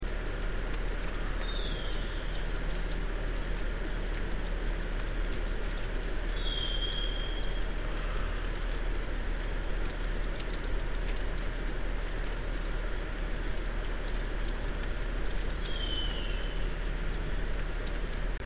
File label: mystery calls